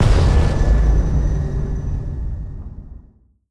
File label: perdition beam hit; apocalypse